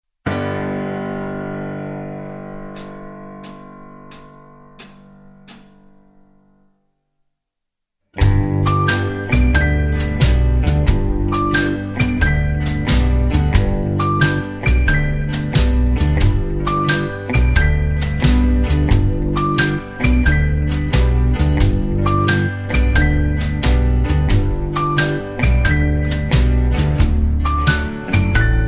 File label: (G) No Backing Vocals